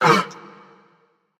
nv breath; smite; xbalanque

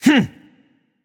gruntjump; smite; voicepack; mercury

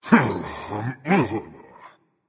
grumble berserker; cuchulainn

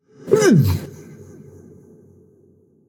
nv tongue; smite; voicepack; hachiman

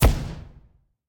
fire impact; smite; zhongkui

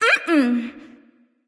nv disagree; smite; awilix

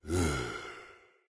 idle breath out; smite; odin

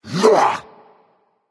grunt attack berserker; cuchulainn

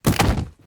defeat bodyfall; Smite; Cerberus

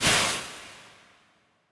fire impact; hades; smite

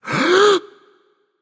breath; smite; apollo

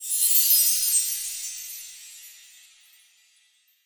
activate sparkle; smite; chiron

